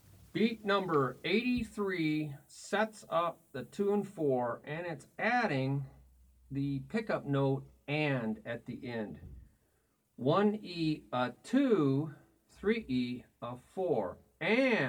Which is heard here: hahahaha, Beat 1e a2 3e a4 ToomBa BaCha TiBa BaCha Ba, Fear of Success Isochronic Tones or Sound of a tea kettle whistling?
Beat 1e a2 3e a4 ToomBa BaCha TiBa BaCha Ba